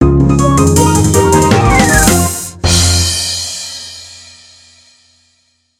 Win Jingle; Mochi a girl; switch gamerip 2019

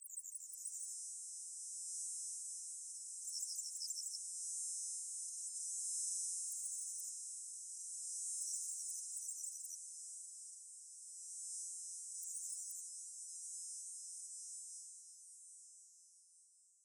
Night; LISA: The Undone

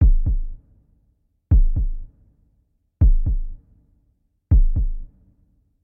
heartbeat; Hachienden; IBM PC

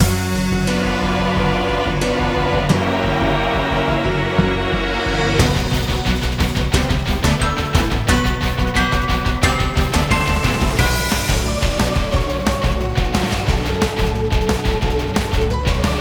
Combat Finalboss 1stphase Full

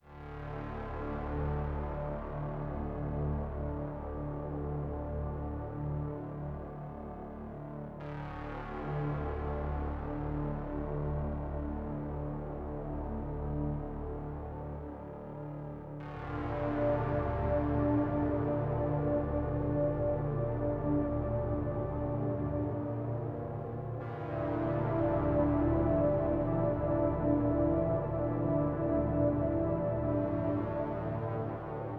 Music Duel 120bpm; Father; Duel Father Path1 Mod2

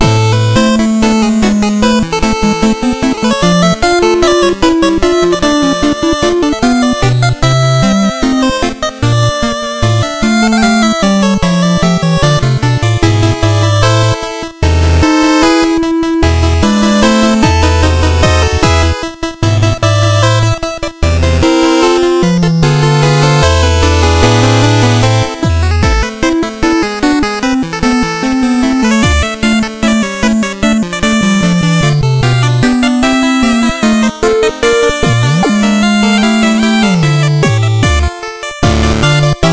Music Village Card House

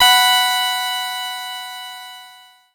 Text Feedback

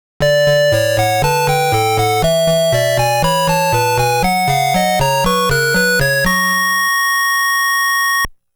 STAGE CLEAR; R-Type Original Sound Box

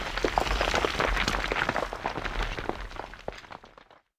Rumble Rock; Puddle

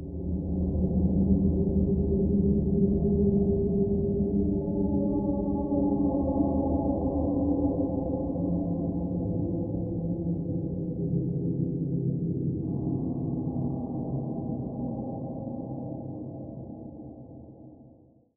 Eerie Loop Short; gamerip

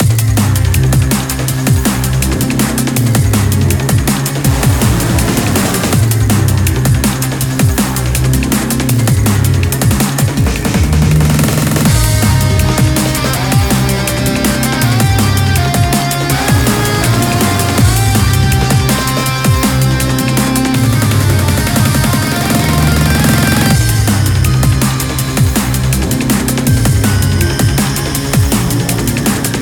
BGM Ingame

